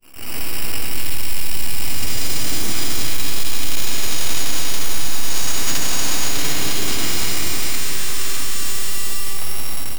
un son de nova de, ice nova

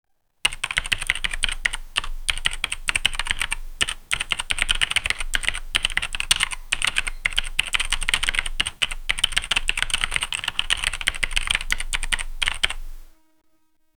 un son raliste dcriture s, keyboard

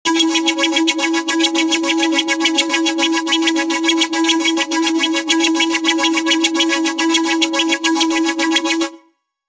un son dalerte complex av, japanese anime

